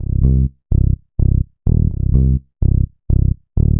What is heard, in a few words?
126 C BASS, Funky